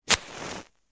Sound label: Match lighting up